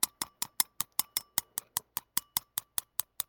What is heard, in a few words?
rice eat